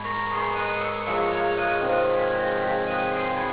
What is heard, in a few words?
carillons, bell; bok